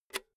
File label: insert jack cable quick